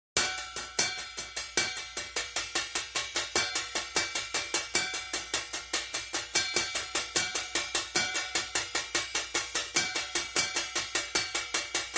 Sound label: High Drums